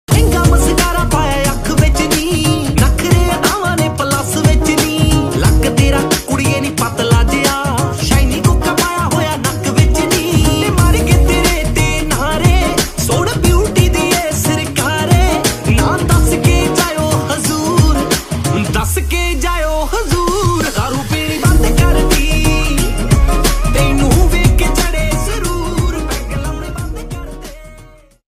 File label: latest punjabi song